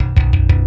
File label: Funk Gallop